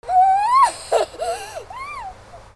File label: PEOPLE